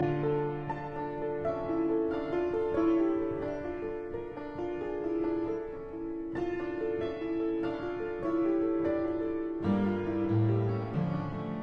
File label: Monferrinas in D minor; 12 Monferrinas; Op 49 No 6